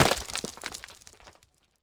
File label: connect stone